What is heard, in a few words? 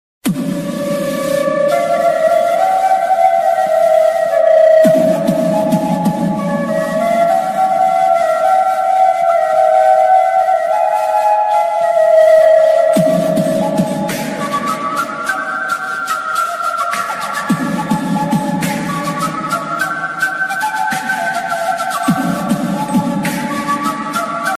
flute beat box ringtone